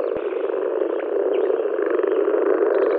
Moor Frog

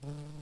Lapidarius queens, bumblebees

